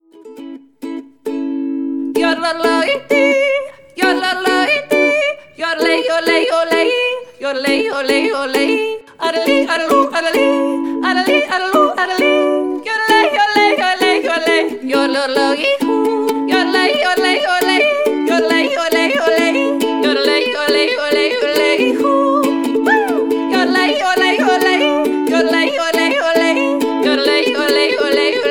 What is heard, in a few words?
Austrian Yodel; Internet Session, Los Angeles